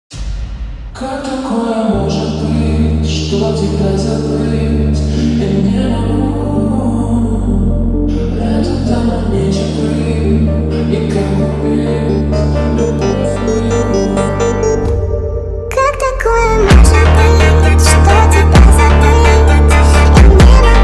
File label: Brazilian Funk Remix